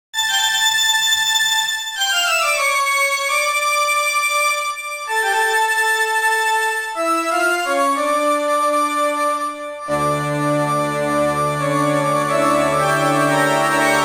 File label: Toccata and Fugue in D minor, BWV 565; Gyakuten Saiban 2 Original Soundtrack; Justice for All - Opening; J. S. Bach